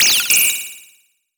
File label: laser delay fx